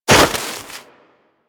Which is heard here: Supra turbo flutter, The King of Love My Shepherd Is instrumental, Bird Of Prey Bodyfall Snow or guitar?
Bird Of Prey Bodyfall Snow